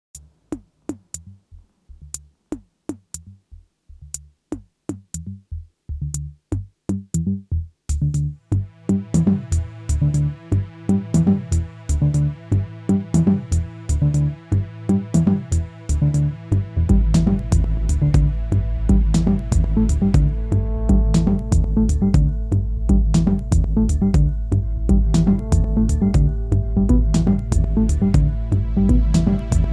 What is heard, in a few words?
Cassiopeia Noise; Keyboard Reborn